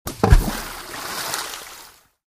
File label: rock splash